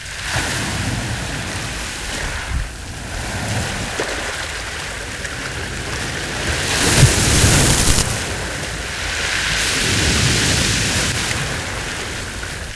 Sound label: waves